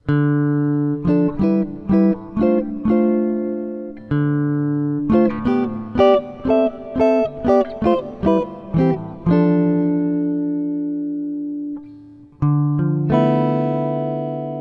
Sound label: Chord Melody; jazz tunes